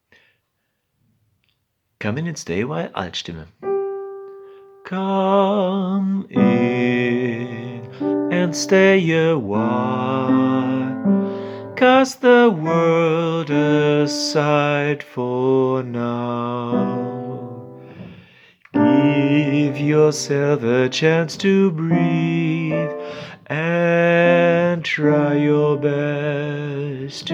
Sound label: Come in Alt